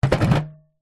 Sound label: household solid small item set down on metal table